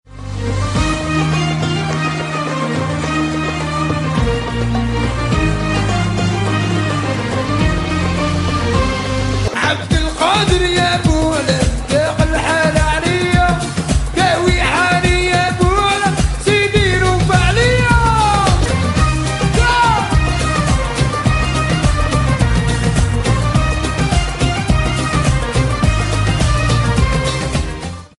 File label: Algerian classic ringtone